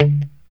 POP D 3, STRAT guitar; Spectrasonics; Hans Zimmer Guitars VOL-1; JC-STRAT POP

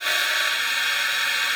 ALTOSAX B5; Xsample VOL-2

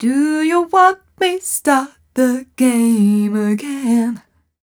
f mel e flat, fem Vocals

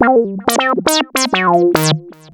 lead (brass)

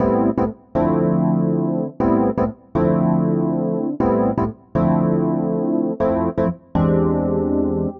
Chord El Piano Am, 120bpm; Zero-G; Extra Chord Progs

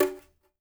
brush congas; Spectrasonics; Liquid Grooves 1 & 2; CONGAS+BGOS

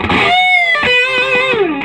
SOLO, Vintage Blues Guitar; USB Soundscan vol.22

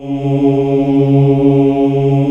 male voice C#2; AKAI S-Series CD-ROM Sound Library VOL-2

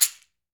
CAXIXI, Latin Shakers

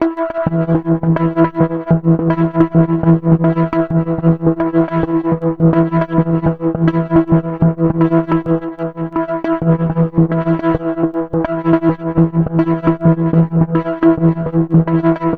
Pulse; Drums & Drones

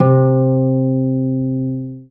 STR HARP, STR Orchestral; Sound & Vision; Gigapack I CD 2

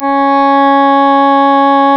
C#, Synclav FM, WIND; Big Fish Audio

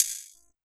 PERC, Drum & Bass, 160 BPM

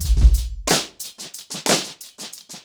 90BPM, acoustic drums; The Roots Drumloops

